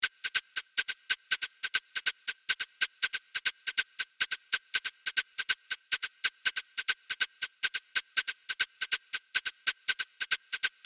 guarapachangueo palito

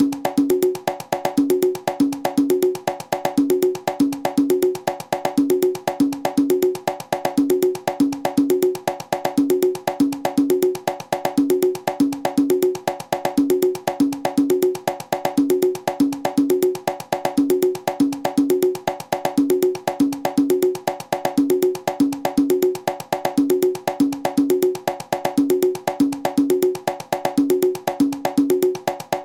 new york mozambique conga

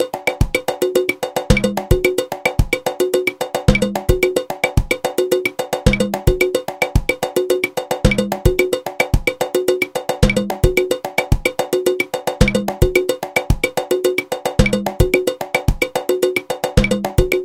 timba

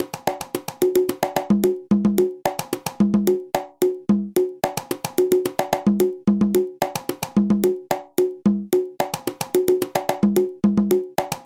batanga conga

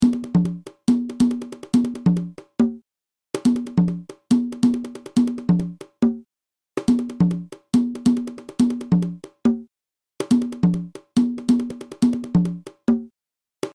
guarapachangueo cajon

